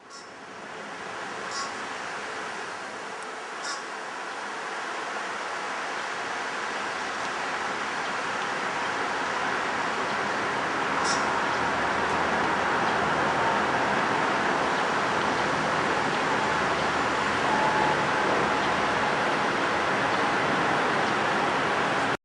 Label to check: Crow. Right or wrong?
Right